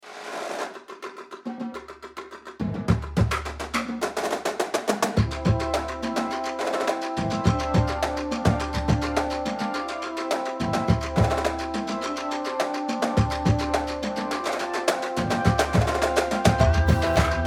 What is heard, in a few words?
Drumline Inspirational